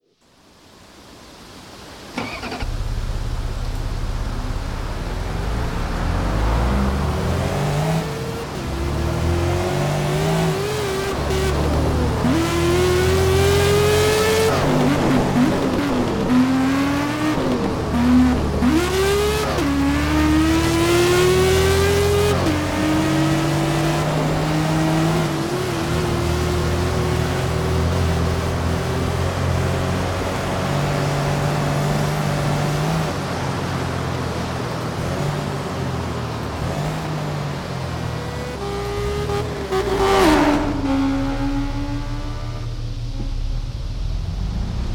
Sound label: renault laguna; TDU-1